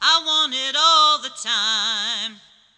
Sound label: Sing Voice I Want, Choir